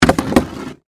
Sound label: Skateboard Land, Sports, Skateboard, Land, Wood, Surface; Mini, Slide; Skateboarding